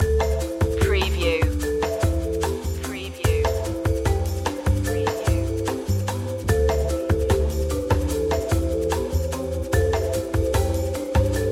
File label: flying home loop